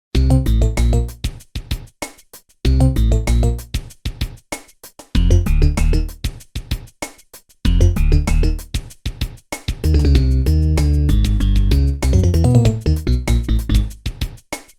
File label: Music; SM64DS; Coincentration